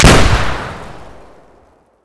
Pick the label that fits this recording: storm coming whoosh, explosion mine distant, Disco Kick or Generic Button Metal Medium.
explosion mine distant